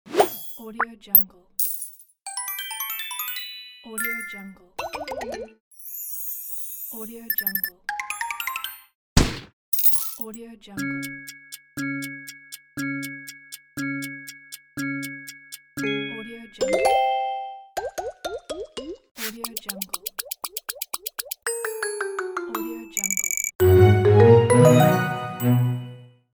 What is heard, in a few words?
game sounds